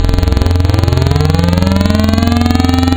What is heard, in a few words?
OscSync, wavetables; Waves Codex